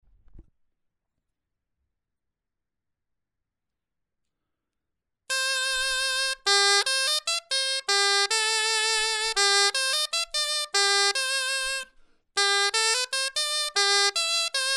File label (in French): clarin etude